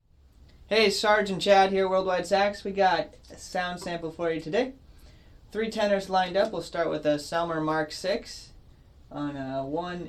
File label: Tenors; Silvertone; 10M